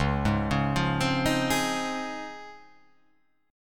C sharp Major 9th C sharp

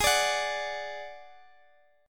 D-Major Flat 5th, G sharp

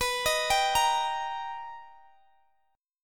B Augmented Major 7th B down Guitar Open D

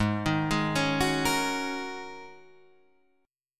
G sharp 9th Suspended 4th, Guitar, Half Step Down

